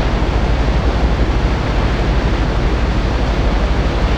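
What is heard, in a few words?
outdoor loop; Garry's Mod; tf2; cp harbor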